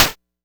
Noise, Cwejman S1; KMK Noise; DevSnd Cwejman S1 - RD

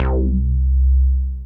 Synth Prophet Bass C; One Shots; Electronisounds

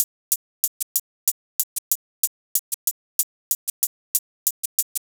Finger Crack Shake Hat 94; Percussion Elements

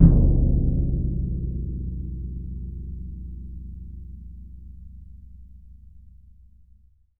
BS DRM P; ILIO; Synclavier Percussion 2; World & Orchestral

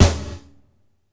Gated Snare; Univerb; creative gate; Gated Reverb

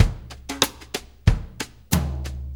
Country, Drum Loops

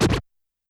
Scratch H, YAMAHA RM1X

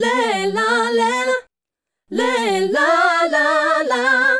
Female Group, Hip-Hop and RnB Vocals

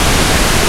PINK NOISE; AKAI S-Series CD-ROM Sound Library VOL-5

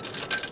CHAIN, Anime Sound FX, ROBOTS

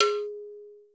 COW H OP; Crypton - DrumBase5000; R70 KIT #1

